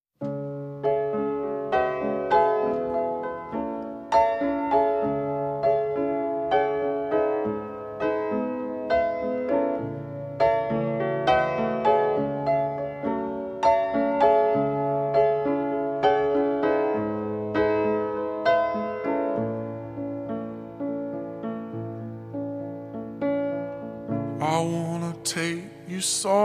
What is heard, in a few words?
slowed down